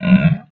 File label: monster; CC0 creature sfx 2